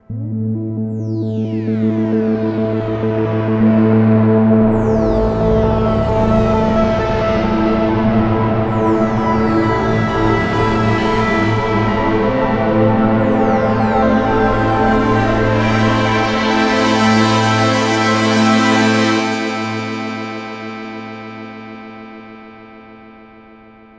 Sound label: novation peak moving pad; novation peak test